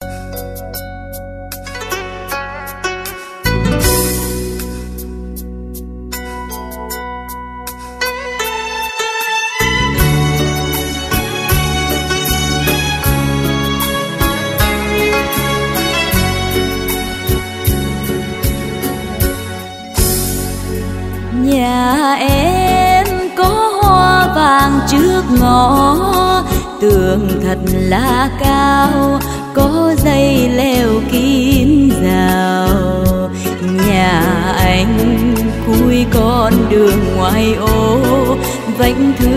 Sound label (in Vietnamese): Liên Khúc Nhạc Sống Nhạc Vàng Trữ Tình